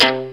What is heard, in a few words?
ROCK GUITAR